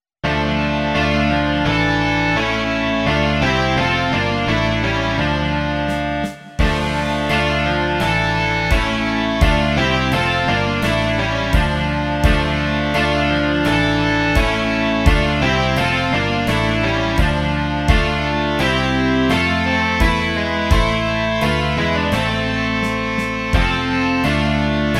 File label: rock